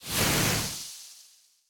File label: Gaslizard; Super Mombo Quest